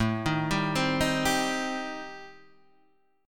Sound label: A-Minor 7th Flat 5th, Guitar DADGAD, chord